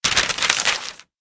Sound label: paper